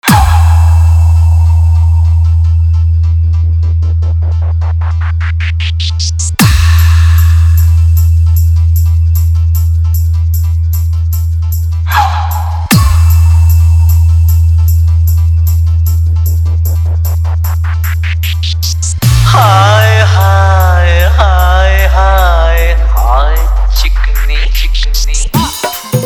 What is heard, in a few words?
Hindi Song Full Dhollki Dance Mix